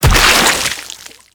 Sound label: Enemy Ink Explosion; Gameplay; Warframe; Shadowgrapher